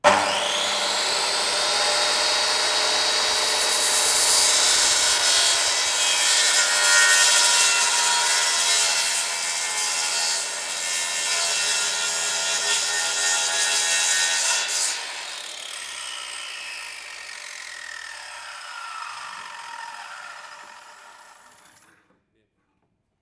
taller; santa fe de la vera cruz; la redonda

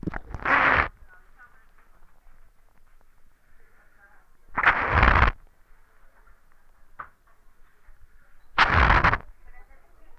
toma mate

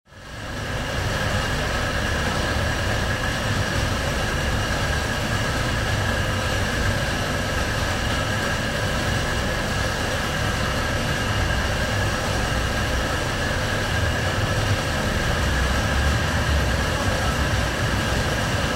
bares bar rotiseria; de bonis; bv orono y rueda

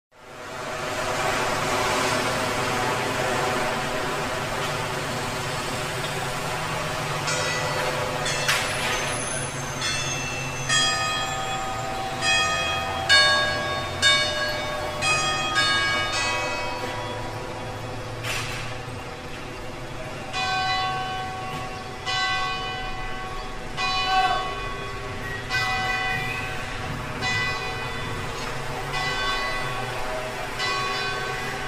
calles campanas iglesia; mendoza

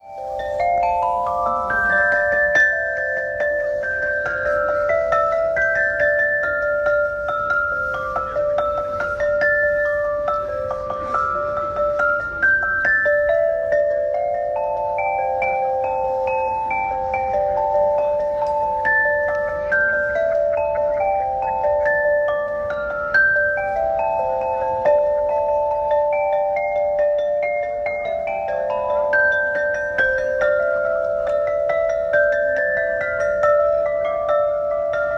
turquia estambul artista callejero

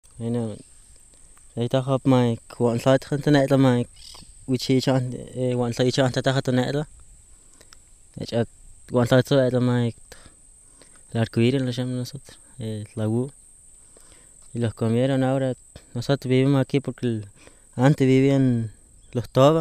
Pozo del Toba; chaco; mision de nueva pompeya

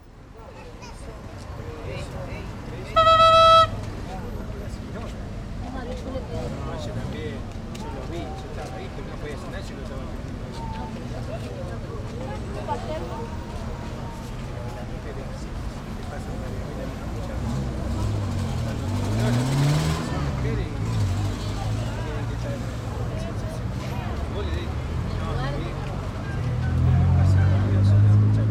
recorrido parque norte

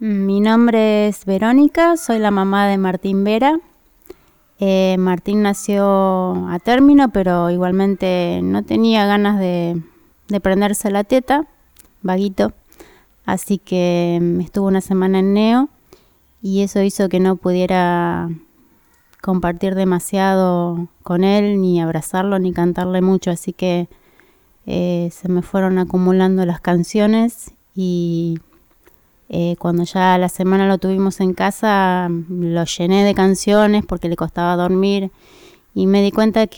canciones de cuna

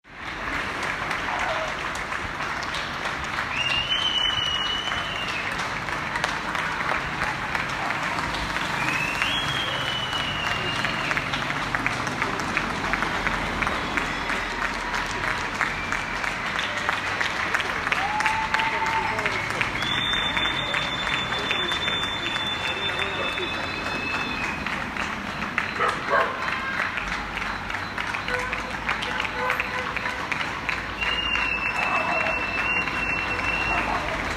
argentina aplaude covid 19; Paisajes Sonoros de Rosario

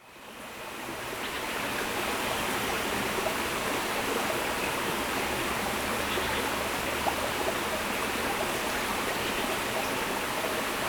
parque forestal nacional, hunan china; zhangjiajie

